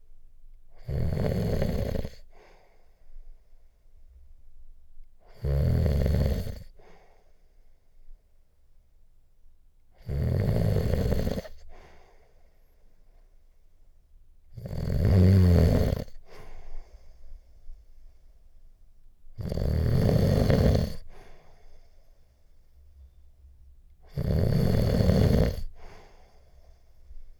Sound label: Snooring Man Close Voice Sleep Human; Soundreorganized; Everything So Far - Collection